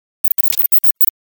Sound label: Static Crackle Beep Sci-Fi; Hollywood Edge; sound designer's toolkit v2